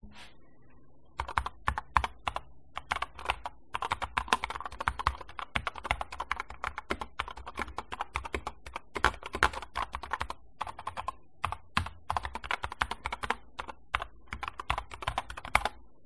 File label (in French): enregistrement son clavier; corsair k70 max